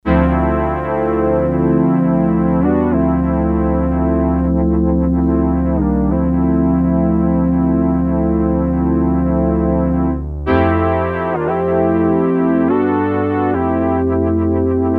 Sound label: Analog Pad, Korg DS-8; Factory Patches